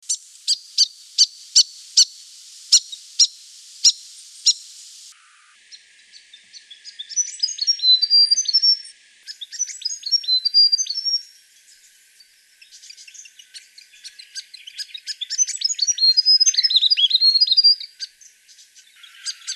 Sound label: grey Fantail; fantails; canberra garden birds